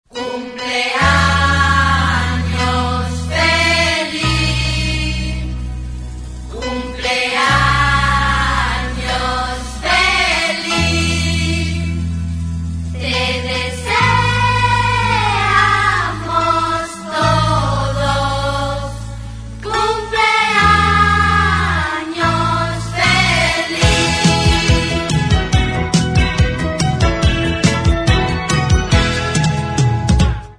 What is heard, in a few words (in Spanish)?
feliz cumpleanos feliz